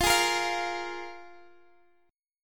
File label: F Minor Double Flat 5th, F, Guitar, Open D; down; chord shape